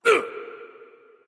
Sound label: grunt; Smite; bacchus